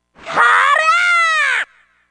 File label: Throw